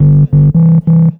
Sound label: Bass - 9B, Jungle; Blu Mar Ten; Jungle - 1989 to 1999